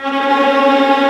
Viola C#3; Digital Sound Factory Virtuoso

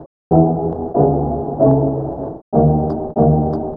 Orch Hits; Chillin Out; Sonic Foundry (Sony Creative Software); Stylus Pressure Urban Grooves on Digital Wax

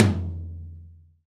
TOM TOM, Real

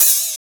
MOD OPHAT; East West - Phat & Phunky

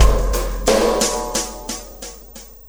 Beat, Loops

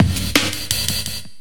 loop, Drum & Bass, 170 BPM

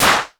TEK.P CLAP, TECHNO; 90 sSampleCDs; Crypton - DrumBase5000

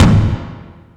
Surdo, Yamaha SY22

